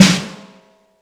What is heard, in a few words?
Snare Brass